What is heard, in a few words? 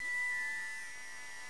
enter; standingstone